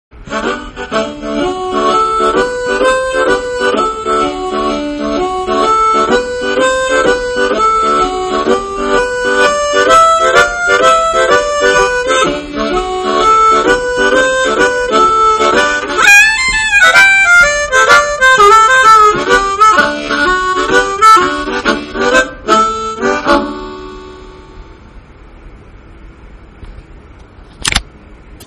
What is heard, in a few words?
blues